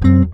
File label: Bass 3 (C) chord; Sonic Foundry (Sony Creative Software); Ugly Remnants VOL-2; Basstuff